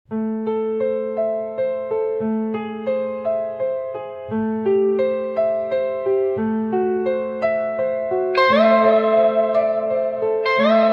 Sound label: psychedelic, instrumental